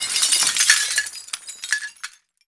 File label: glass window pane breakout; Explosions; Call of Duty Modern Warfare